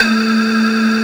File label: BOTTLE, Techno Trance; E-MU Formula 4000 Series Vol. 2